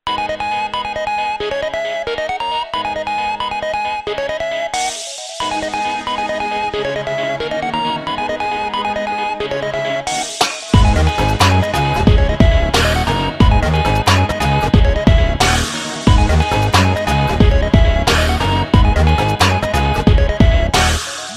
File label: musiq with drum beats